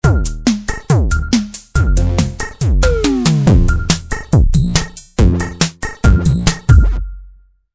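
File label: Analog Drum Menu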